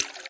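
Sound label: water flow short active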